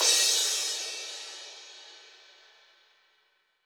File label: CRSH, CRASHES